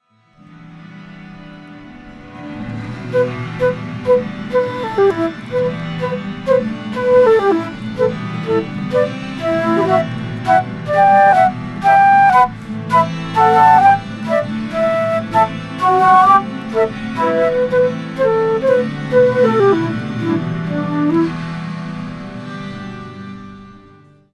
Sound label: flute ident